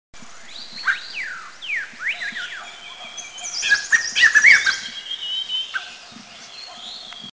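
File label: Striated Laughingthrush song; Eaglenest; India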